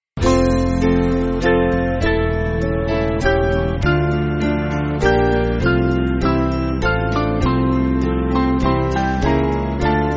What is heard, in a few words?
Band